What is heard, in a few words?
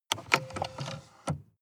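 sports car interior drink holder open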